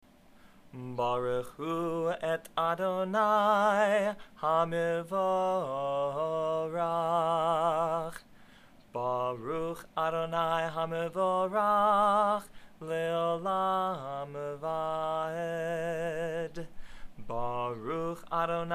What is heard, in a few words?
Barchu Morning